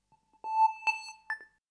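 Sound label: synth electro 140 A; Techno; Keys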